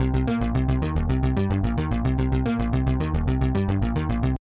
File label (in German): bass focusing eq passiv mit saturation; softube passive-active pack testbericht